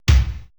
80S DRUM SET

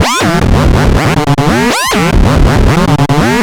Analoop, Industrial

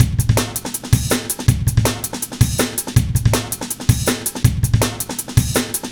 Step, Jungle Breaks; Jungle Warfare 1&2

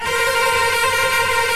CELLI M TRLL; OMI - Universe of Sounds

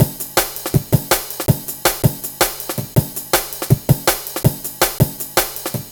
162, breaks, Loops; M8

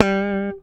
Slap One Shot G, Bass; Bass X

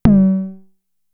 Tom, Toms; Fricke Schlagzwerg; Drum Machines

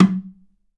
Sm Dmbk; Digital Sound Factory

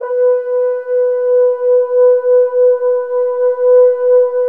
French Horns

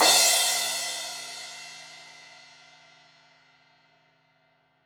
Crash 16in edge, Cymbals; Deeply Sampled Drums

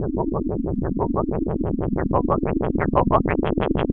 LFO, Noise

